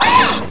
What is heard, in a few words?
machine